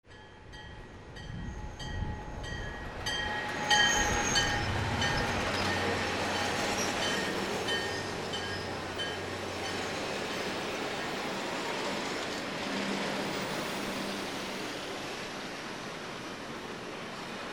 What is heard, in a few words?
TRAINS